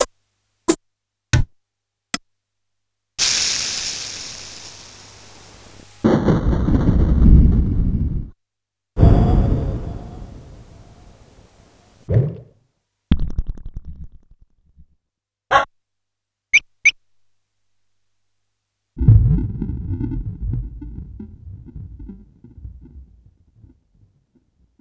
Yamaha PSR-E323 SFX Kit

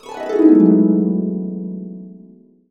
E MAJ, Harps; USB Soundscan vol.62; Complete Harps; AKAI

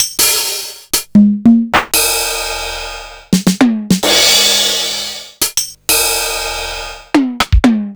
Linn Drum LM2

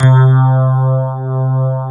FM ELP 8 C2 L, Keyboards Old School; USB Soundscan vol.09; AKAI

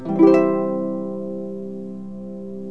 HARP GLISS

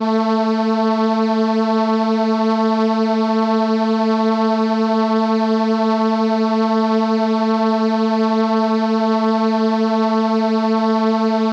Trumpet A4, ARP Solina

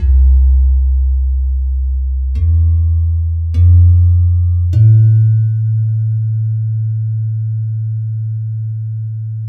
Pelog Slab Gong Bass; Melodic; Ambient-Melodics-II

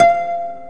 KOTO F3, ETHNIC; AKAI S-Series CD-ROM Sound Library VOL-8

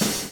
DRUM CHOP; AMG Gota Yashiki Groove Activator

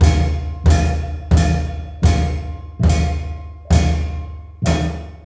MALAY PHR L, Heart of Asia

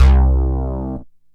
SYNTH LEADS; Megabass Remix! 2

